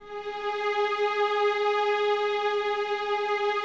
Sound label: Violins G#4, String Ensembles; Miroslav Vitous; LINKS ORCH